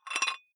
dumbbell thread take off small weight soft, fitnessstudio; sport freizeit